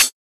00s low treble high hat sound B key, hi-hat tuned to the B note; hat samples